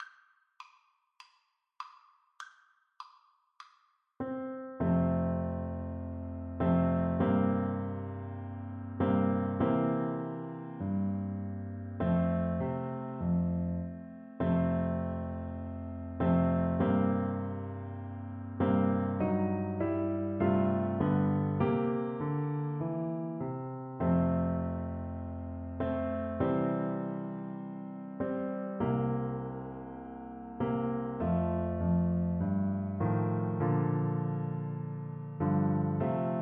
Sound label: america the beautiful, trumpet